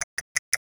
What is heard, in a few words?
bell, vocal